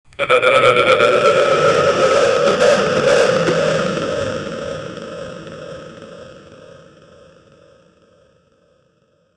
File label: ghost suddenly appear sou, spooky